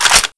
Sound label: aks in, weapons; paranoia